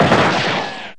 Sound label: Gun